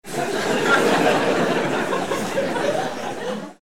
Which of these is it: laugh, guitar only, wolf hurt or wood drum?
laugh